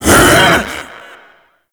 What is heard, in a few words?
Attack Short, Vocals; Enemies; Warframe; Proto Infested; Ape Prelate